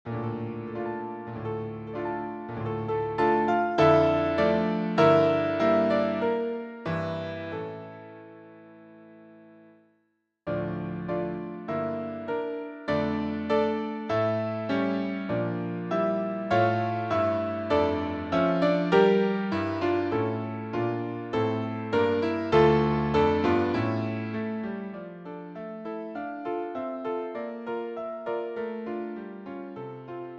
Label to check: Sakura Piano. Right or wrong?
Right